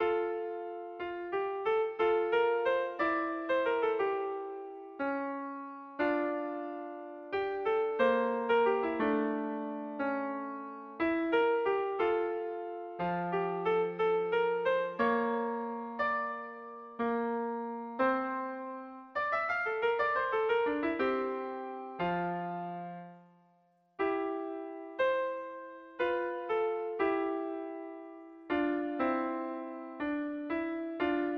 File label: trumpet duet